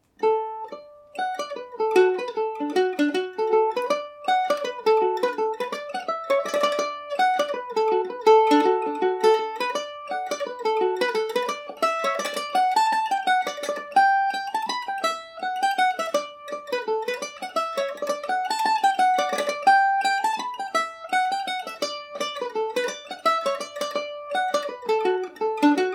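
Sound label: D major, irish mandolin; sailor on the rock revisited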